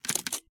Close Window; MiSide

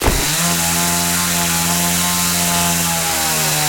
Kill Player Chainsaw; Game rip; MiSide

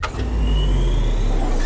Door Start Move; Game rip; MiSide

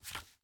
First Take Card; MiSide

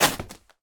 Teddy Tear; MiSide

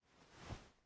Movement; Game rip; MiSide

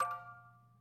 Click Table; MiSide

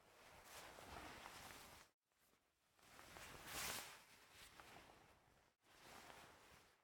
Player Door Open Normal; Game rip; MiSide

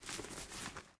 Glue Cup Inside; Game rip; MiSide

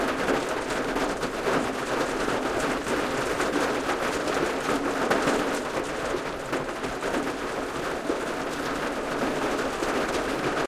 Rain Window; Game rip; MiSide

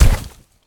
Enemy Boss Fall; MiSide

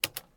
PC Button; Game rip; MiSide

